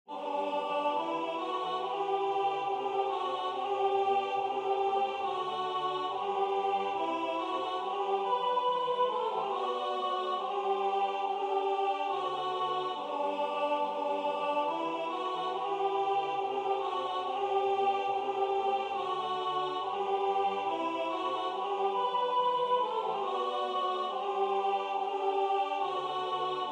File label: alto; Christmas Oratorio 59; Waiheke Island Choral Society